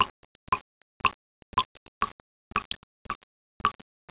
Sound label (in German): tropfen